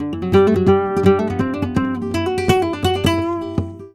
Guitar, Flamenco, c; Best Service ProSamples vol.46 - Flamenco; Frase

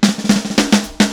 Clinical Beat Intro, Indie Rock; Sonic Foundry (Sony Creative Software); Indie Rock Anthems; Session 02 - Clinical

